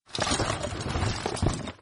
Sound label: chains